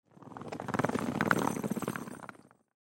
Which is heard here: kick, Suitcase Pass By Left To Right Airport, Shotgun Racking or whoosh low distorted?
Suitcase Pass By Left To Right Airport